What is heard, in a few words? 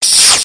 spawn